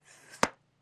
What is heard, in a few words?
Bubble Gum Blowing, bubble gum